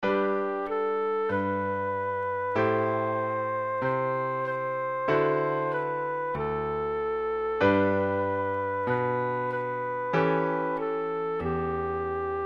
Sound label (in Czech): bezela ovecka fletna klavir, zobcovou flétnu; zobcová flétna + klavír; Běžela ovečka - česká národní